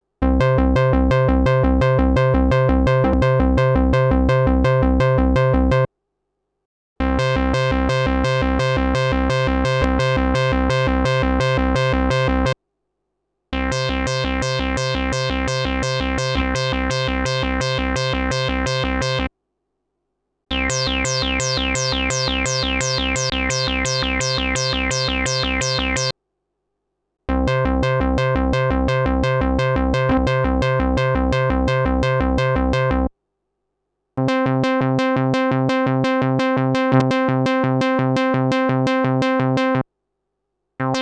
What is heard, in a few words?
sh-101 bassline envelope arp test